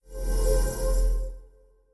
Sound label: dream in; kult